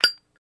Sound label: Zippo Lighter Flick; lighters & matches